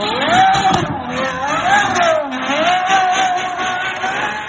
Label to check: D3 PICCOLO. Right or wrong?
Wrong